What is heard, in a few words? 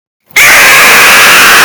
relaxing sounds for sleeping